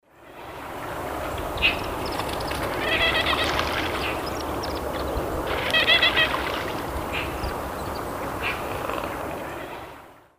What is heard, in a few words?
Tachybaptus dominicus, calls; S. America; Eastern Lowlands